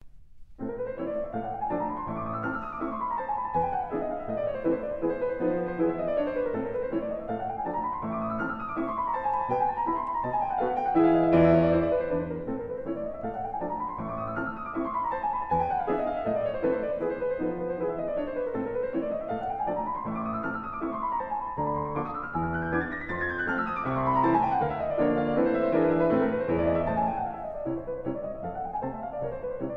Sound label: Chopin Étude in A minor Op. 10 No. 2; Sendai International Music Competition